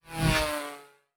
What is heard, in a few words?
Fly By, Sci-Fi Sounds Movement